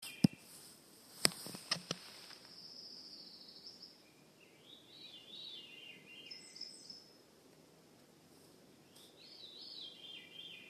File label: Veery, Alaska Juneau; Laurie Lamm